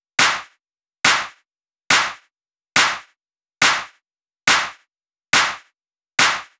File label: drum Loop 140BPM Clap